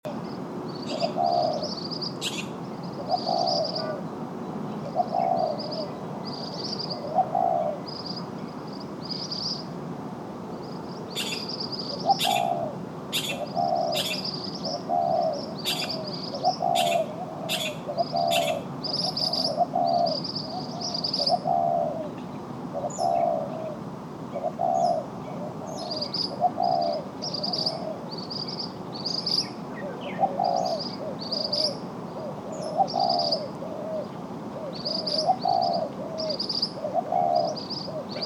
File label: Pericrocotus divaricatus